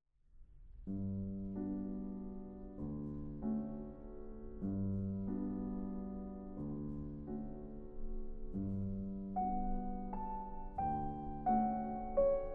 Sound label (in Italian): pianissimo